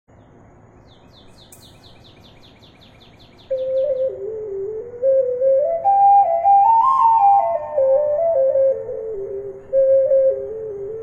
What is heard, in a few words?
celtic ocarina